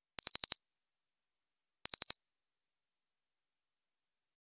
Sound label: ringing chirp repeated; standard ringtones